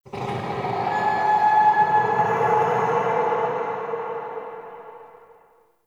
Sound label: Growly Overtone; Ambience; Warframe; Shadowgrapher